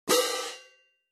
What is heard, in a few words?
16in Classic Orchestral Selection Medium Heavy Pair, cymbal sound